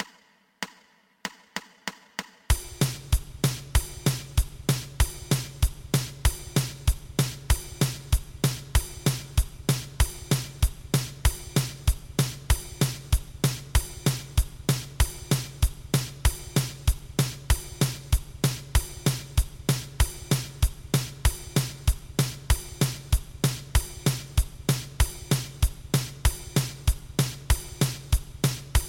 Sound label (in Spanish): 2 pulsos